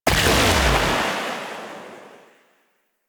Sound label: Rifle Shot Alt; Weapons; Warframe; Thanotech